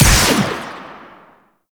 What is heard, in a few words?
Igniter Pistol Shot, Weapons; Warframe; Corpus